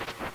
kriechen; Metal Gear Solid; gamesoundopedia

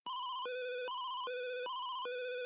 feindbewegung; Dig Dug

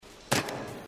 landen; inside

